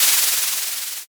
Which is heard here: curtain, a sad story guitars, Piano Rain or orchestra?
curtain